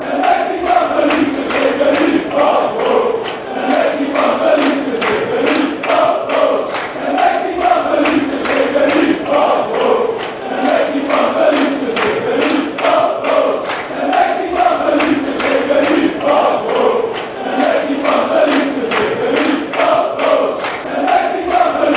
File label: singing song, fan chants